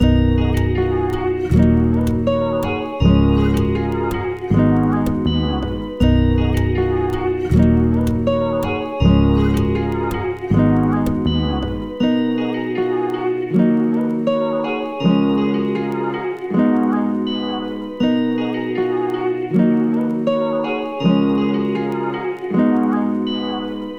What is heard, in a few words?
160 BPM D# Min, Guitar; Beautiful; Cymatics; Miami Guitar Pack - Pt 1